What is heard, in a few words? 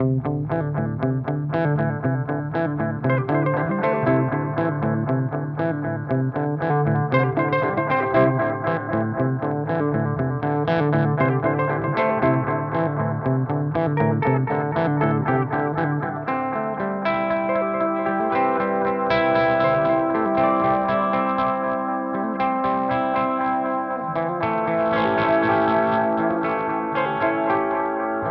Timeline Digital; Strymon